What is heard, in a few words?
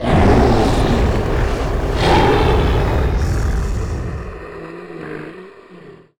Dragon with Fire